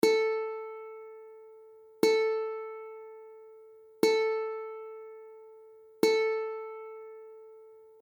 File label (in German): ukulele stimmton a